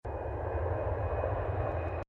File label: windy; the wild